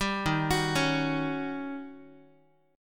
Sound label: Eb 7th Sharp 9th, Eb, Guitar; Eb7#9 arpeggiated; 10,11,9,11,x,x; JGuitar